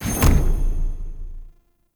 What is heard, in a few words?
Sweetener Heavy Attack Stun; Weapon Impacts; Player; Sweeteners